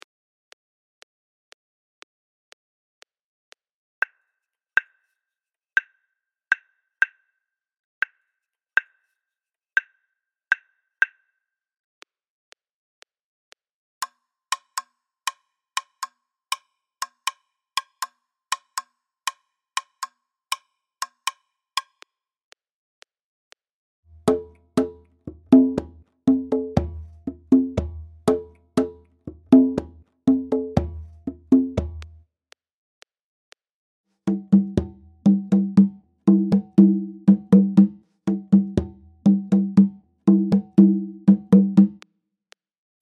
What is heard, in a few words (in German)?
Rumba Guaganco 120, lateinamerkanische rhythmen; Lateinamerika